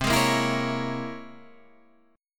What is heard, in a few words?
C 7th Sharp 5th Flat 9th C